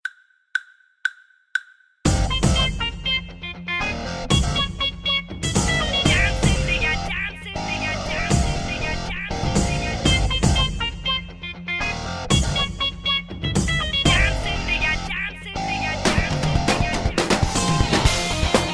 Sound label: Rock